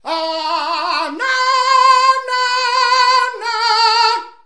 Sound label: diva opera diva